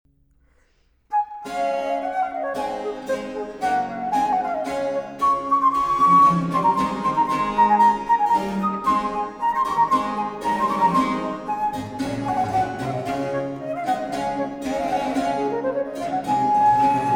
Solo 2, D-Dur für Flauto traverso & B. c.

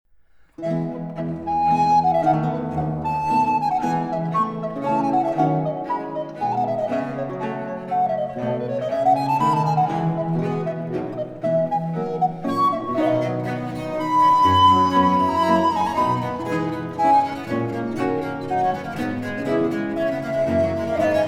Trio 7, F-Dur für Flauto dolce, Viola da Gamba & B. c.

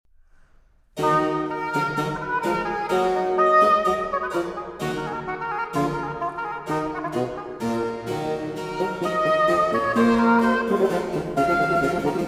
Solo 11, e-Moll für Oboe & B. c.

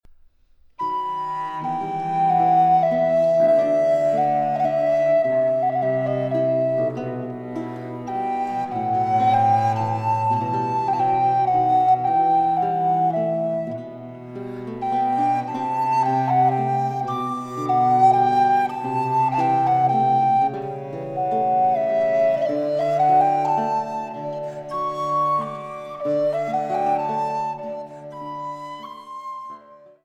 Solo 10, C-Dur für Flauto dolce & Basso continuo

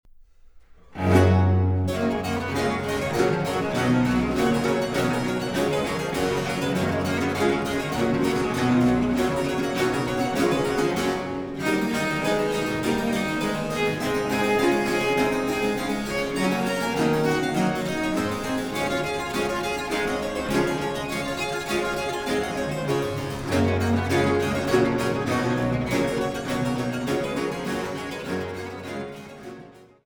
Trio 2, G-Dur für Viola da Gamba, Cembalo & B. c.

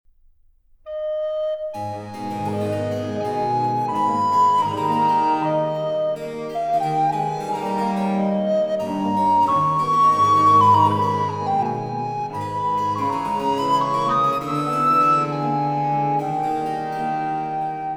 Trio 5, a-Moll für Flauto dolce, Violine & B. c.

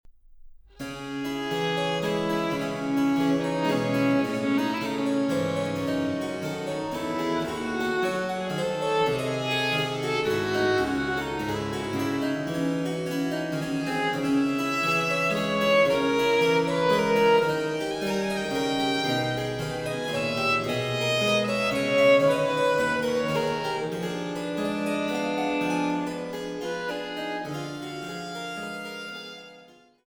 Solo 1, F-Dur für Violine & B. c.

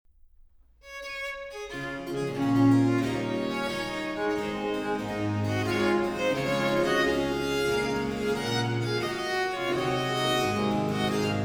Trio 10, D-Dur für Violine, Viola da Gamba & B. c.

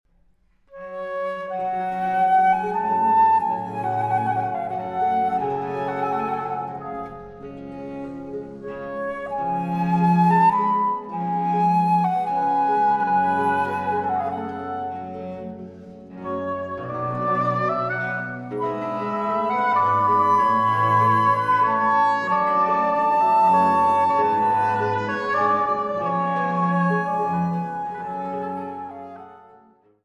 Trio 11, d-Moll für Flauto traverso, Oboe & B. c.

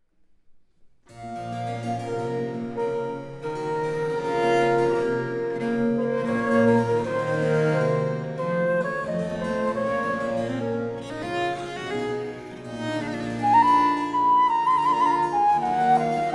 Trio 6, h-Moll für Flauto traverso, Viola da Gamba & B. c.

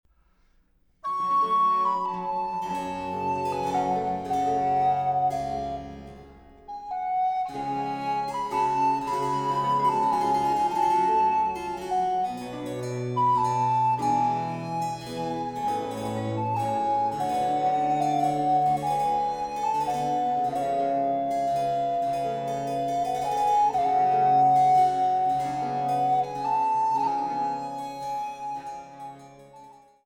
Trio 8, B-Dur für Flauto dolce, Cembalo & B. c.

